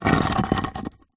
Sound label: brick small move scrape on dirty concrete; foley; zapsplat